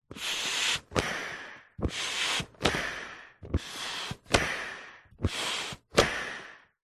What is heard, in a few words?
industrial pump foot pump pumping slow; zapsplat